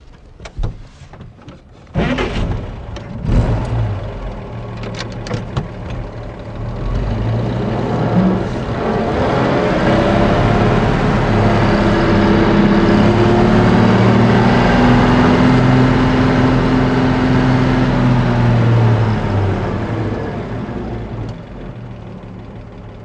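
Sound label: Chevy Truck 46 Start Shift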